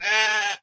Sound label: Sheep Mom Idle; Farm Mania 2